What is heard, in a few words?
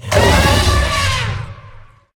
att, enemy, combat; baurg